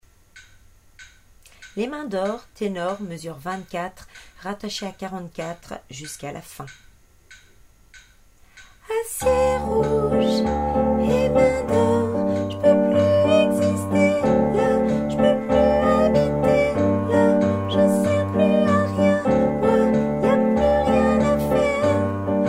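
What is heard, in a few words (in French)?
ténor refrain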